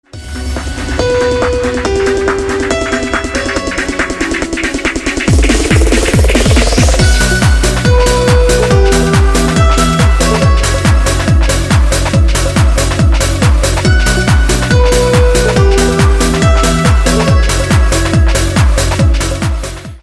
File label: instrumental